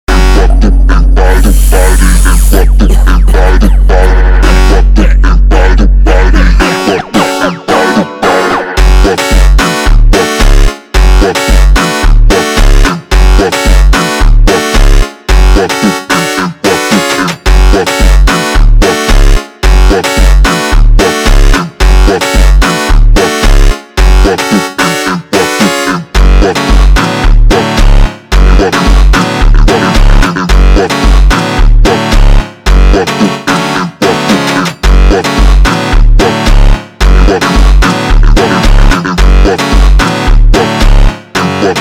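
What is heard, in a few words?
slowed, phonk music; funk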